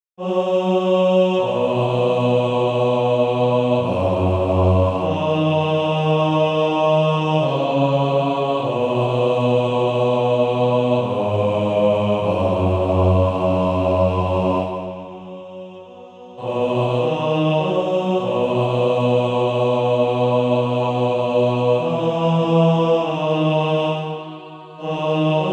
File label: Male Choir B2; Bass; Amazing Grace